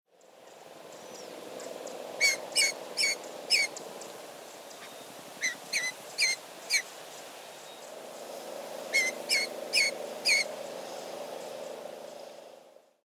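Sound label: kiji female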